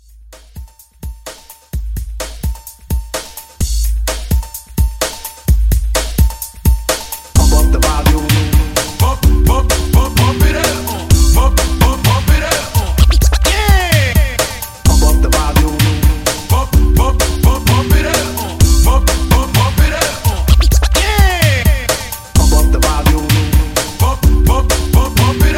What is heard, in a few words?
80s Extended Hype Re Drum 128